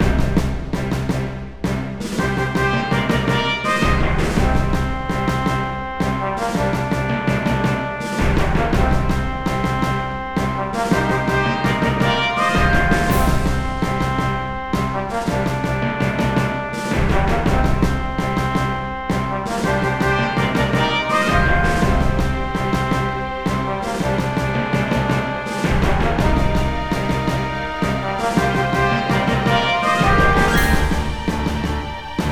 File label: battle loop